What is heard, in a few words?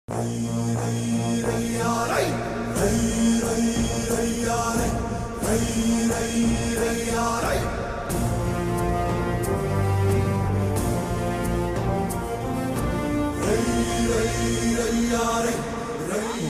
theme bgm ringtone